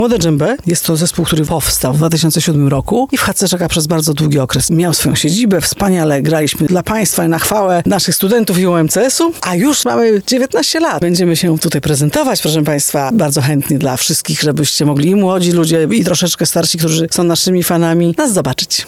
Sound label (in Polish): Djembe, Afrykańskie bębny; Lublin; Młode Djembe; Afrykańskie bębny wzywają do tańca.; Akademickim Centrum Kultury i Mediów UMCS Chatka Żaka